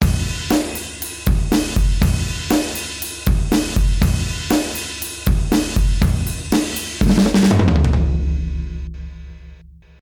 Drums; TRacks 670

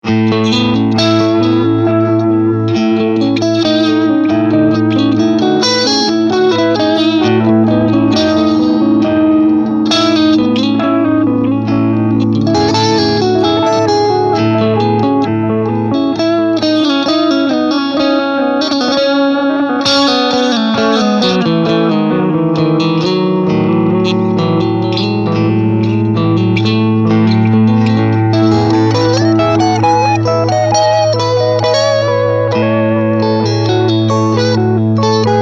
AC30 TB Analog Echo

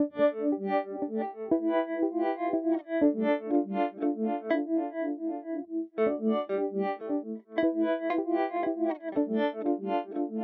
Beebo Harmonic Tremolo; Poly Effects

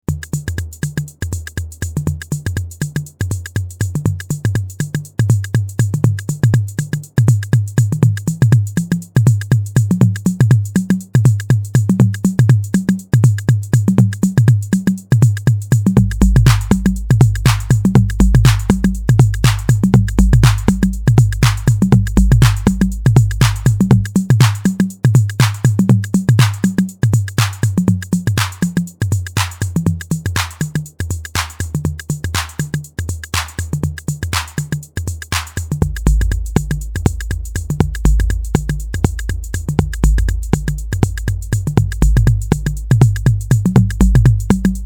drumatix toms

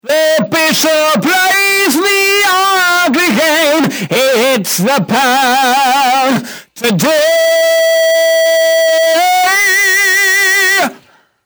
Distortion

